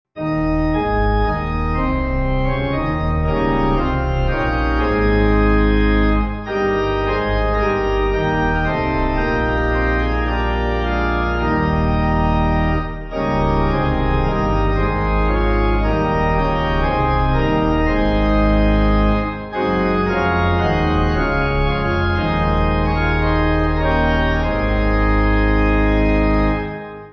Pipe

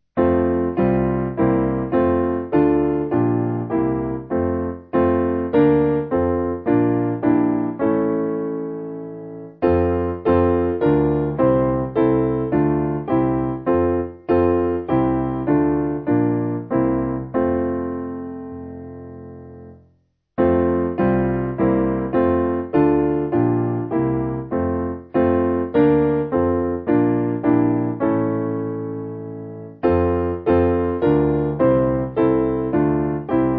SPiano

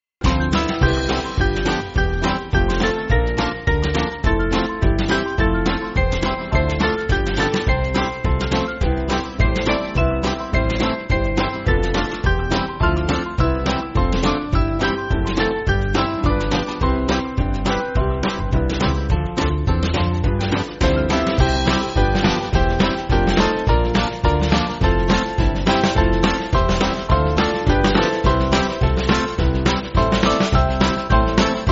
Band; McKee

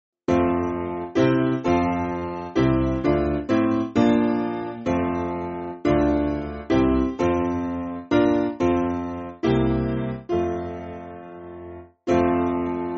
Piano, Music Backing Files for Churches

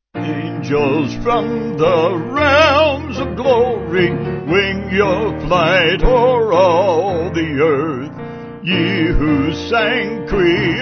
Vocals Piano; Angels From The Realms; Regent Sq

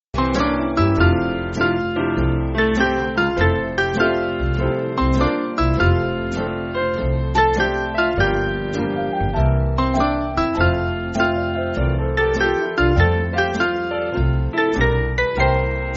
Band

